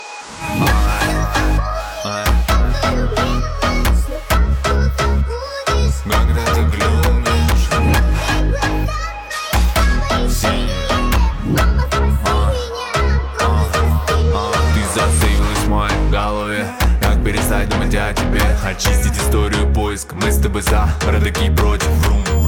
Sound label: phonk remix